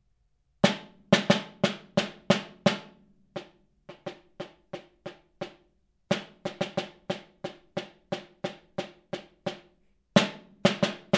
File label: snare